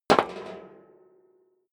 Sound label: step high heels metal rattle single stair up; schritte